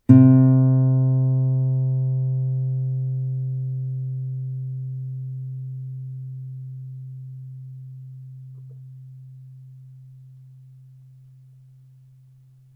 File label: nylon